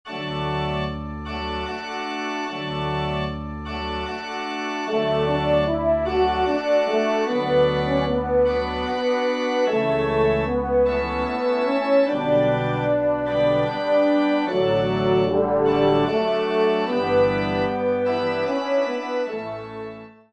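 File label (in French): Romance, Trompe Orgue; Saint Saens; Teknia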